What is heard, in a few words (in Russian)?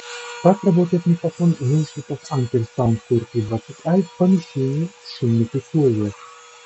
s shumom ruchnoj mini pylesos